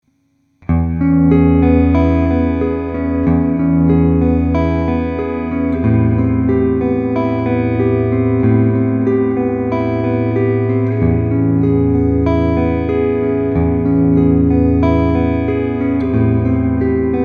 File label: ibanez tm1803m talman prestige clean picking neck